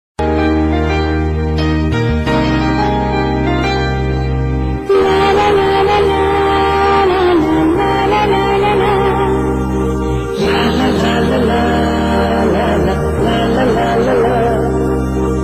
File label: humm